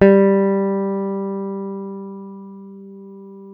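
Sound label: jazz guitar; Univers Sons; Jazzistic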